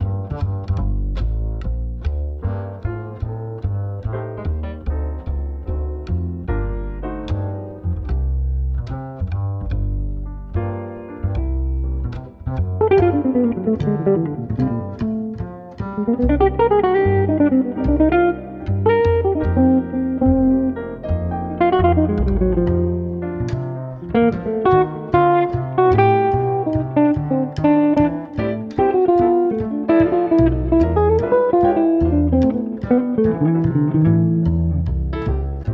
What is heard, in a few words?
Cafe Jazz, Groovy Music